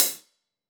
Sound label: KHats HlfOp